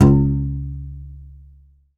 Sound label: C. Bass Balalaika, Plucked Strings